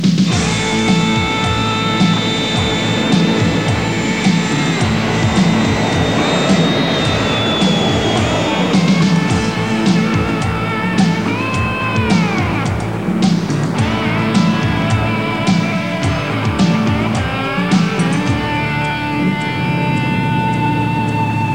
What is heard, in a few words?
Quiet Cool Riffage